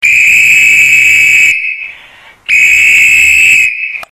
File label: thunderer